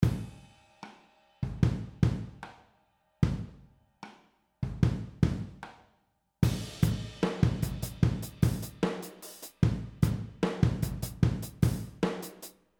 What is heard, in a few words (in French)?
drums overhead brut